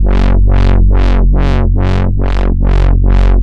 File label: heavy dubstep wobble bass f